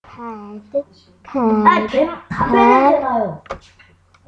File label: pad